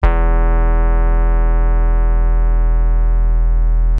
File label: Bass